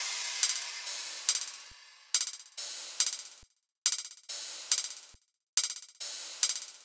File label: Riddim Drum Loop 140 Top